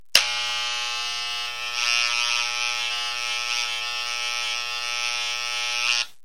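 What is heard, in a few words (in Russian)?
звук электрической бритвы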